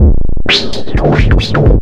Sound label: FILTER, Big Beat Drumloops